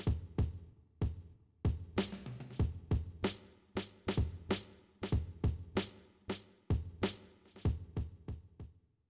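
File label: with drums, instantaneous; SiSEC11; underdetermined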